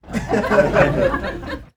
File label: Crowd Laughing; Vocals & Wallas; 1001 Sound Effects